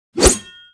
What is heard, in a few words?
ATTACK